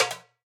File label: item get; Petrichor PSTVL